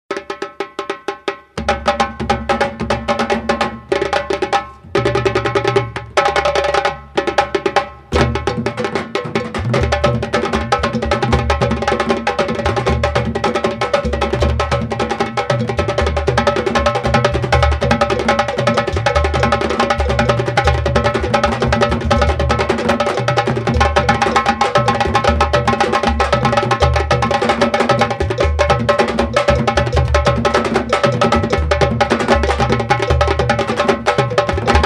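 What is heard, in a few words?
Djemben, Dundun, Udu, Ago go; Trad. Senegal; Timeline: 3 / 2 Clave; Djole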